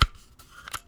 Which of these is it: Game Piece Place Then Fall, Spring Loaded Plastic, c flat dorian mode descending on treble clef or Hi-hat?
Spring Loaded Plastic